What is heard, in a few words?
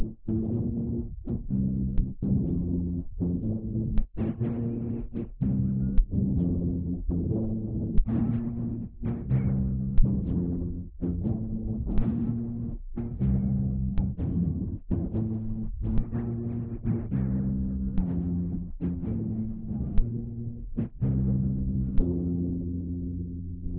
gen bass